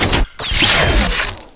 switch on, weapons tesla; nzp

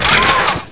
load, weapons; ParoxysmII

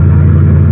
engine; q3rally; raptor

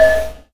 hit; q3rally; feedback

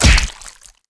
leap kill

